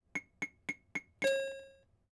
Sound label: slot machine